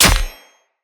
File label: Sword Impact Flesh; Weapon Impacts; Short Sword; Combat; Local Player